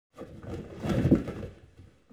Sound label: plastic; ambience; Soldier Of Fortune II Double Helix; XBOX